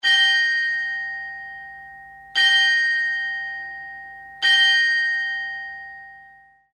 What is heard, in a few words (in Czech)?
zvon dar maltezskeho radu; zvonohra